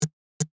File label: Smart Phone Vibrate, Technology, Smart, Phone, Vibrate, Respond, Slow; Cell Phones; BlastWave